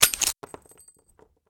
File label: bing; Barotraumatic; Clowns; ClownBomber